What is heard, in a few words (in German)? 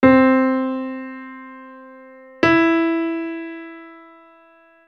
grosse terz